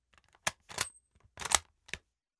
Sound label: k98 slow; Bolt recording: Ejecting empty case and reloading next round; K98 bolt action slow speed; Vigesaa Productions